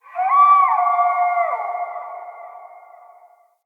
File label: Wolf Cub Trapped Call Distant; Animals; Wolf Cubs; Orengal Wolf Cub B